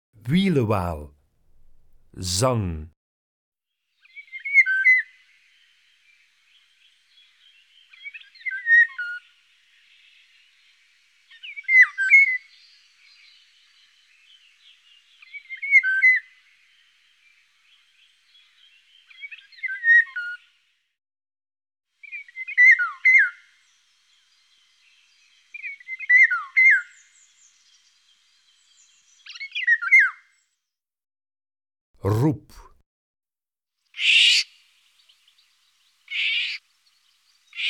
Wielewaal, Zangvogels; IVN

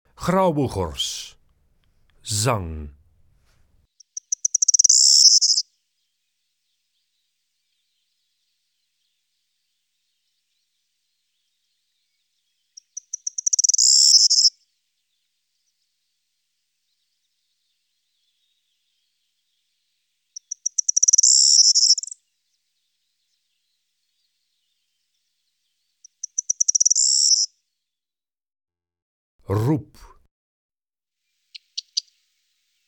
Grauwe gors, Zangvogels; IVN

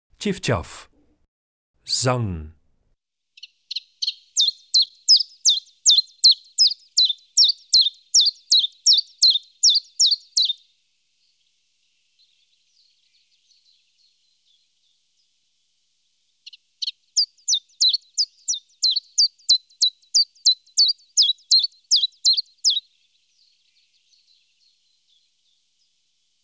Tjiftjaf, Zangvogels; IVN

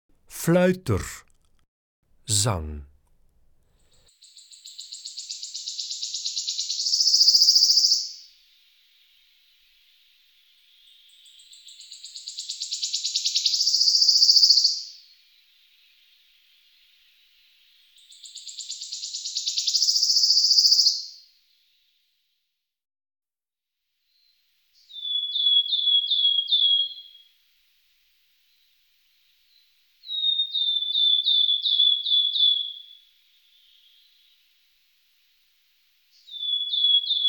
Fluiter, Zangvogels; IVN

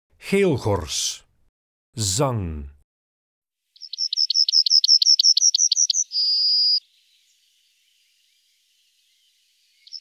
Geelgors, Zangvogels; IVN

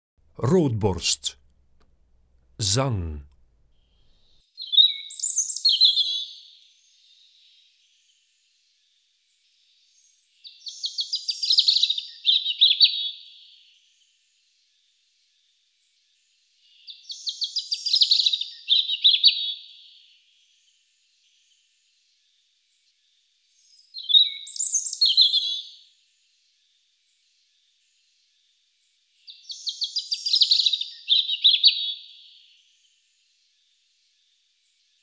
Roodborst, Zangvogels; IVN

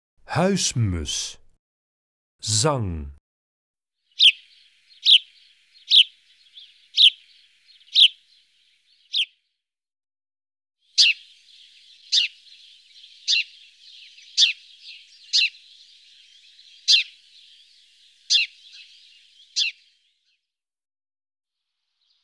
Huismus, Zangvogels; IVN

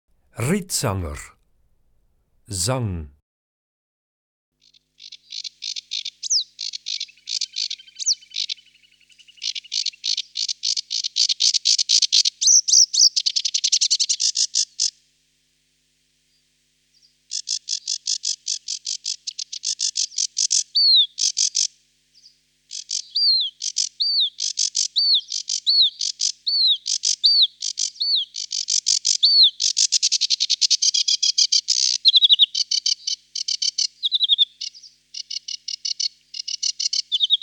Rietzanger, Zangvogels; IVN

